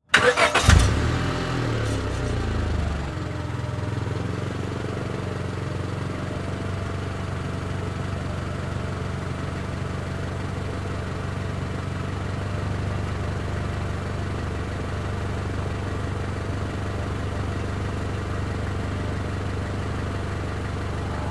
Transportation Cars Honda Civic Start Idle Off Mic In Rear